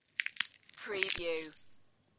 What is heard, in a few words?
Peanuts, crunch nuts